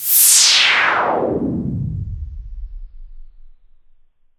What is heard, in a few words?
Downlifter